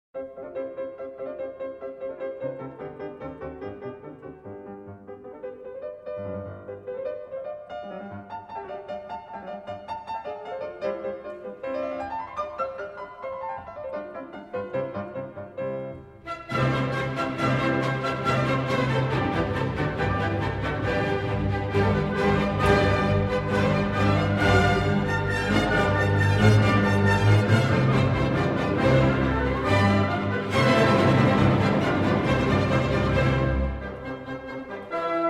Piano Concerto in C Major, Rondo. Allegro Scherzando; Beethoven; Piano Concerto No. 1; Op. 15 III.